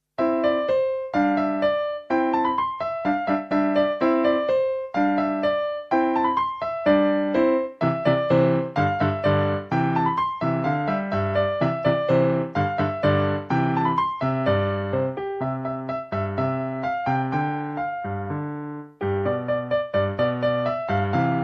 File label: ringtone